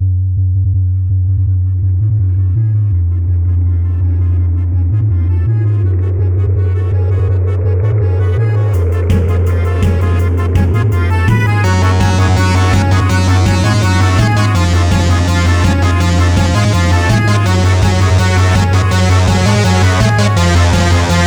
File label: guitar